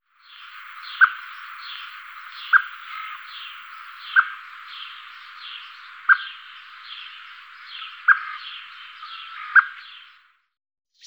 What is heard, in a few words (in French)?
merle noir diverses alarmes; Quelques alarmes du Merle noir; L'INDICATEUR N°3